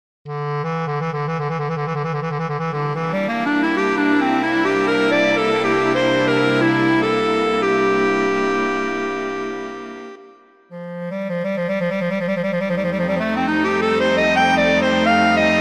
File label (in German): Klarinette